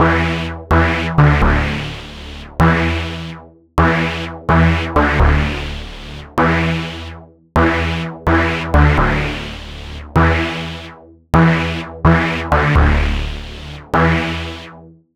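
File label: hard saw synth trancy E minor 127; synth loops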